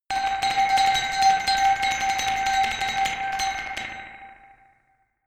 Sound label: glockenspiel dark tremolo high